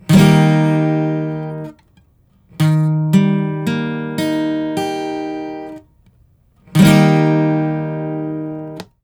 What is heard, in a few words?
Major or Minor